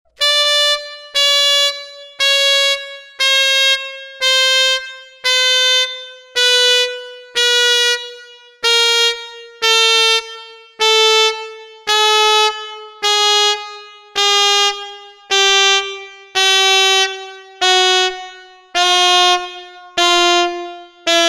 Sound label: quarter down, sax